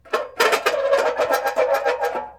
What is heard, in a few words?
Metal Creaks